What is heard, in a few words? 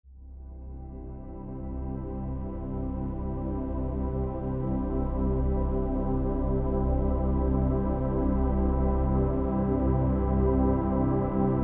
Ambient Background Music for Peaceful Moments